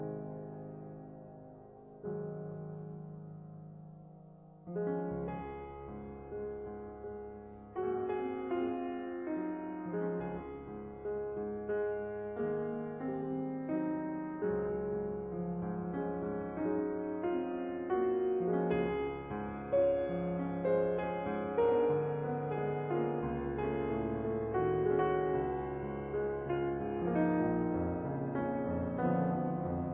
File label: Piano Sonata in C sharp minor Andante misericordioso; Bortkiewicz; Piano Sonata No 2; Op 60